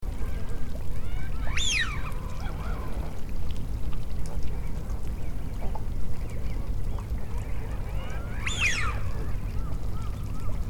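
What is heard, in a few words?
Eurasian wigeon; hidorigamo; hidorigamo kibagata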